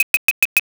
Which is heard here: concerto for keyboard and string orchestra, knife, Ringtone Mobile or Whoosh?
knife